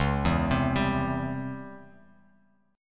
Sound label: Db Minor Major 7th Flat 5th Db down Bass Standard; DbmM7b5 arpeggiated; JGuitar